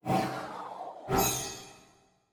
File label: Achievement success; Towaga; Android